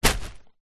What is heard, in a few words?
foley plastic bag containing paper recycling set down